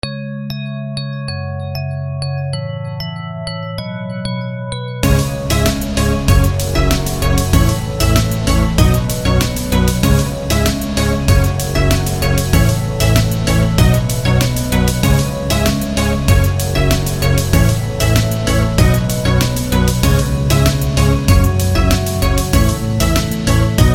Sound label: beat ringtone